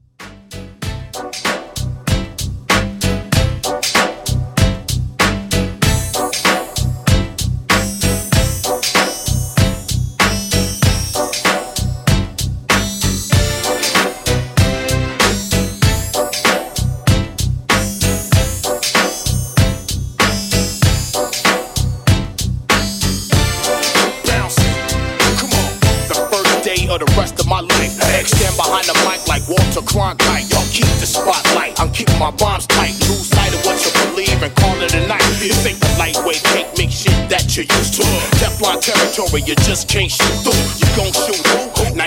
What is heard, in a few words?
Mashup 96Bpm